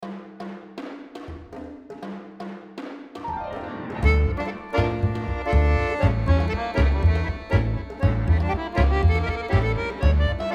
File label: Tango